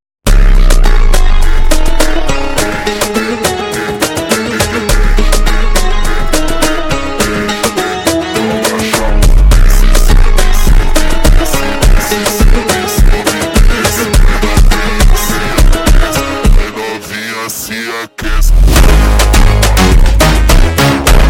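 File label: slowed, phonk music; funk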